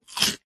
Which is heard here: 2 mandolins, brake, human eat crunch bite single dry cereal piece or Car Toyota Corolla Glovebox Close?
human eat crunch bite single dry cereal piece